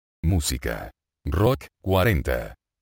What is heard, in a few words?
rock